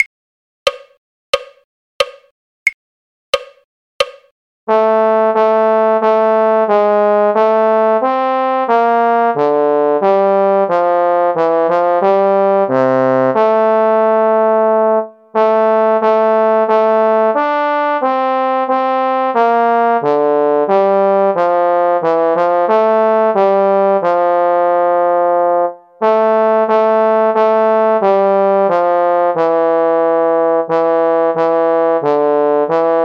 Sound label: trombone; carols